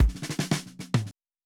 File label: 70 s Fill 108 bpm, drums; Basement drums vol 1